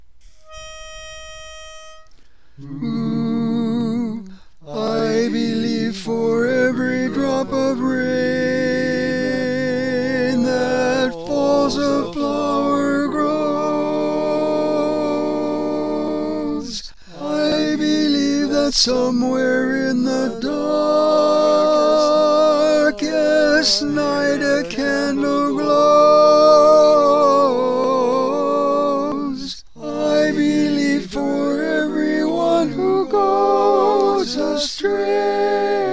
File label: Tenor, barbershop